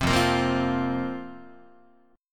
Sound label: F Suspended 4th Guitar, chord; Fsus4 strummed; 3,1,1,3,4,4; JGuitar